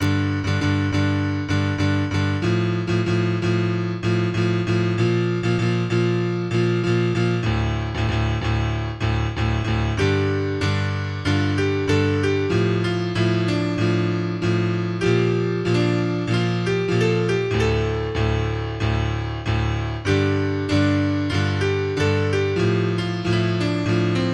Piano Version

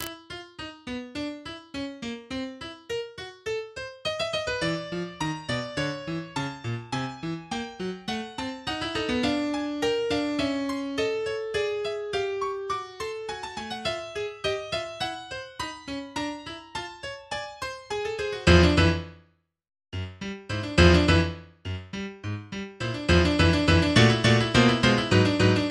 Prelude No 4 in E minor

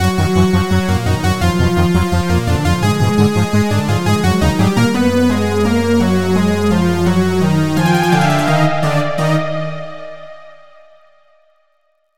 Dark Analog Short; MIDI

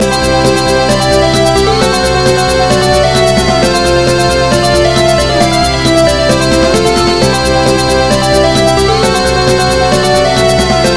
Music; Tetris 2 & Bombliss

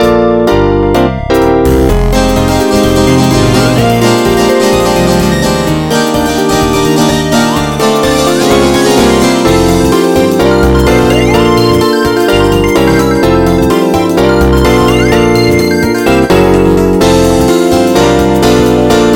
Overworld Electronic Jaz remix

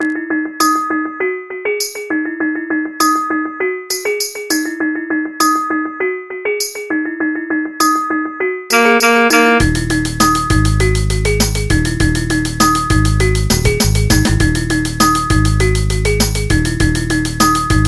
Bass Test

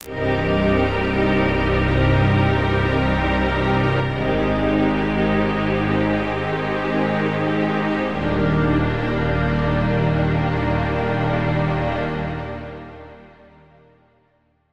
7ths Example

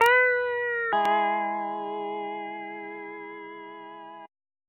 Steel Sting

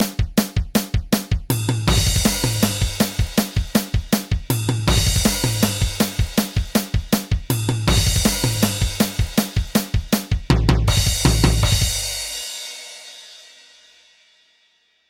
drum intro; rockso

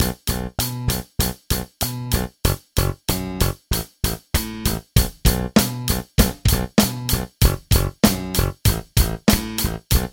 Bassdrums

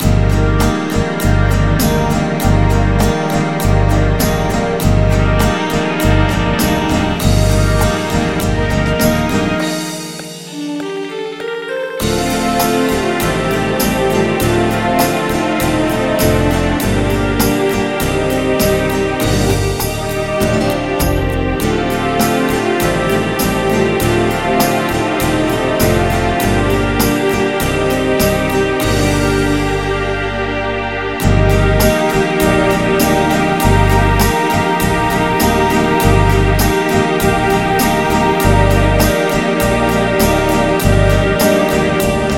8Beat

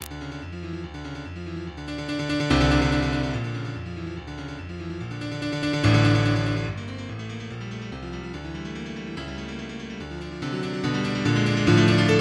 Etude Op 109 No13 Storm

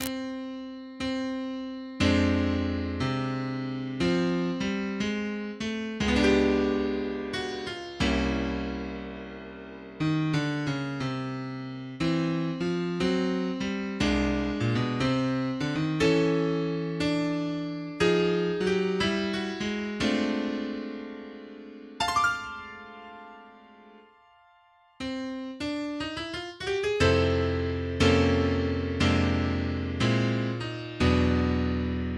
Happy Birthday Jazz

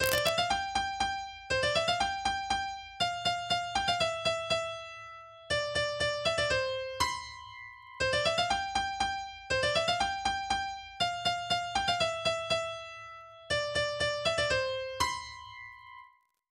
Lullaby; Dead Space

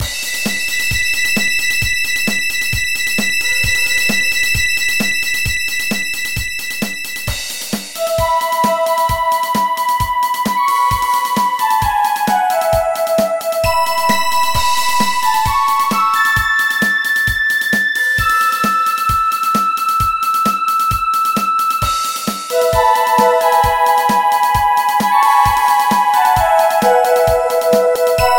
Trance Remix; FL Studio

